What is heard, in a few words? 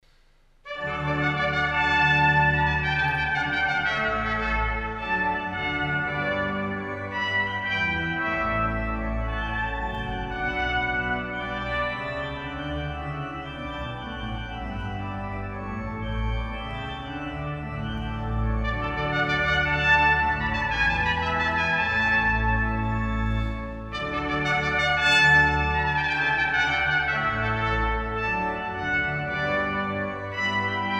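suita d-dur gigue, varhany; g.f.handel; inau koncert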